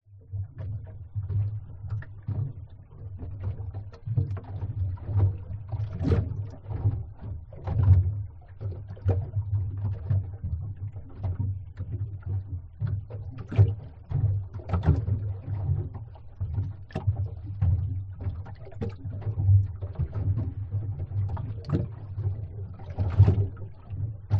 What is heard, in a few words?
transport boat floating engine off waves choppy inside hull cupboard fiberglass